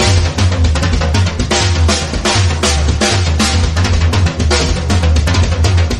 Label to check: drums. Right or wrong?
Right